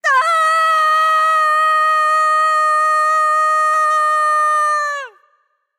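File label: fear, female voice; smite; voicepack; hera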